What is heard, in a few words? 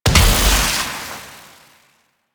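Invisibility Projectile Explosion; Warframe; Quests; The New War; Drifter; Abilities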